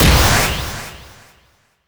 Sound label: boss Hit